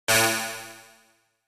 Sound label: Elect Bounce, multimedia element